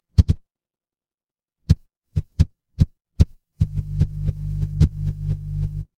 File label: fire electric hum